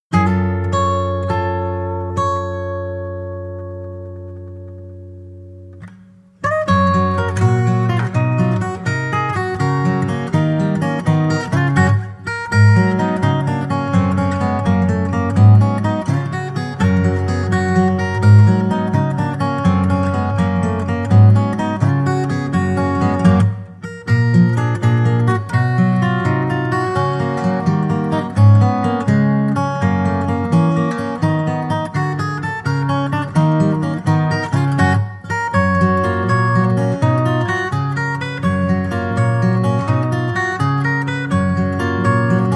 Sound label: guitar stereo example